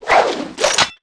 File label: whip sword draw